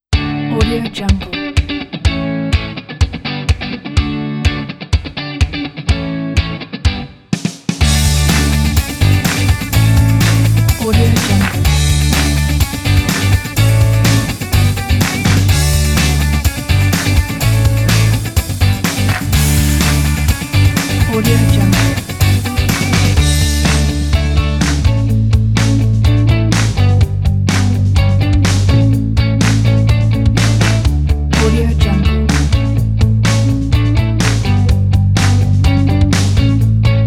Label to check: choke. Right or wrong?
Wrong